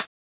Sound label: gui menu